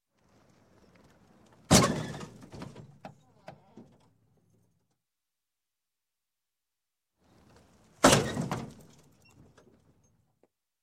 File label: Crash, Auto Large Fender Bender; Sound Ideas 2000 Series